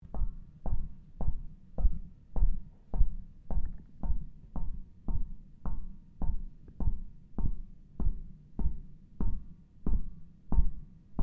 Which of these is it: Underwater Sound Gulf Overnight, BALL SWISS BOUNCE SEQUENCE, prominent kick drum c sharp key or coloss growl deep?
BALL SWISS BOUNCE SEQUENCE